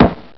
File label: KNOCK